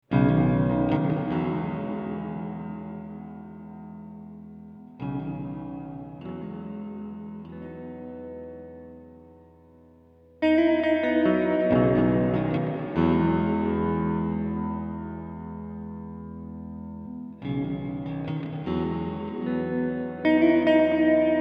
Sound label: Baritone Ambient; P90 style pickups; bridge neck cream color